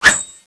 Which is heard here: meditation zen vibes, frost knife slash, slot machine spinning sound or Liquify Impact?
frost knife slash